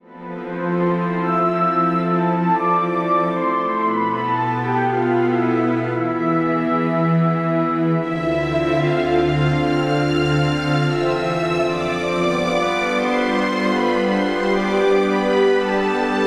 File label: Orchestra Version